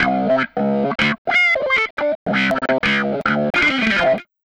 Guitarlike, Leads And Riffs; Sonic Foundry (Sony Creative Software); Synchro Funk

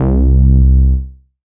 C1, Roland JV 2080 TECHNO PAD

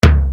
LINN TOM, Linn 9000

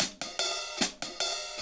HAT, HI HAT LOOPS, Dub; PERC LOOPS; Drumdrops In Dub VOL-1

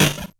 Bent Snare

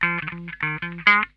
OLD TIMER, Funk Guitar; Zero G - Funk Guitar

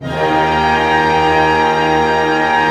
PENTA D# FF, orch penta; Sound On Sound - Peter Siedleczek's Orchestra

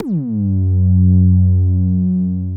OB D#3 -R, Rare Synthesizer; Hollywood Edge - Giorgio Moroder Rare Synthesizer Collection; OB 4 VOX.

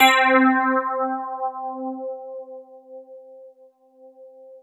SY99 EP; KEYS; Alpine Volksmusik

